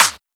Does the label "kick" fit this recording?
Yes